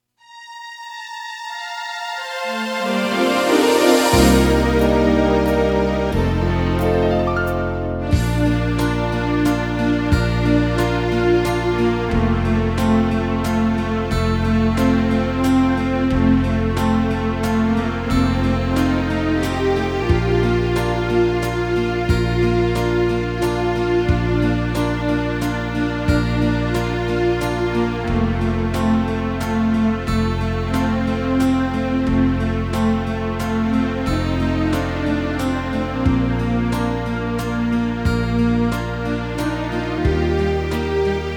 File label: Waltz Strings; Karlstejn Castle